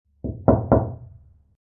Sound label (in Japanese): ノック音